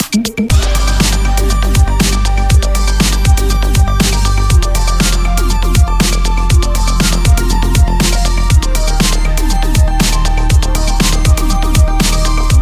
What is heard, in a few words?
electro party; tron legacy